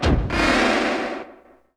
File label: synth door, Science Fiction; E-MU Producer Series Vol. 3 – Hollywood Sound Effects; Columns